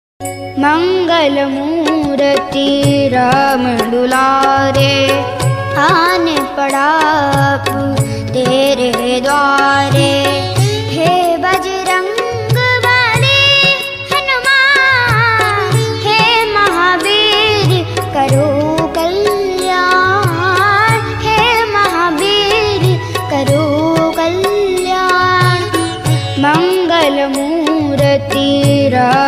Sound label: bhajan ringtone